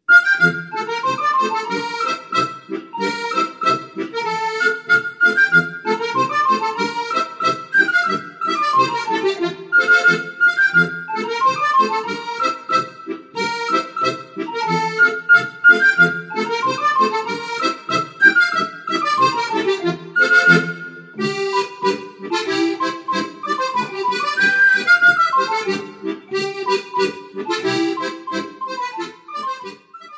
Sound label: Gundi Polka